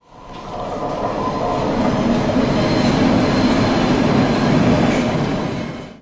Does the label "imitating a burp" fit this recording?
No